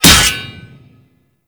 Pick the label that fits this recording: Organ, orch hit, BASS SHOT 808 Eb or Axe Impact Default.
Axe Impact Default